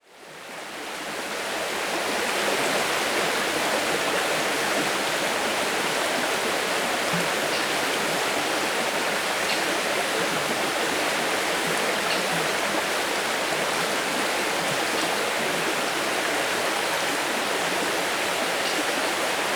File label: River Ambience; Biolateral